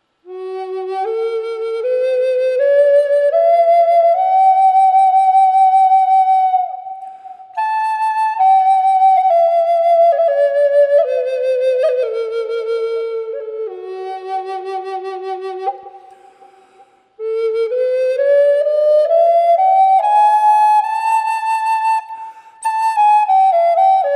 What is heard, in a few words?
sapelle f-sharp wet, flutes